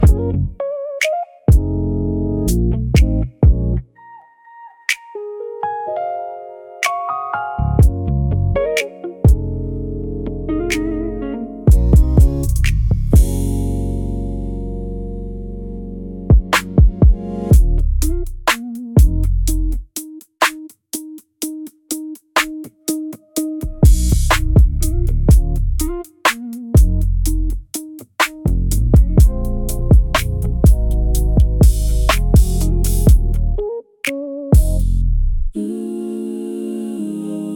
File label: Late Night Glide, neo soul rnb; for sophisticated adult fashion